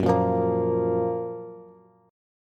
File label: F sharp Major 7th, Bass; strummed; JGuitar